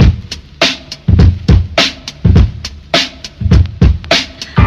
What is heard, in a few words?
Drum loops Boombap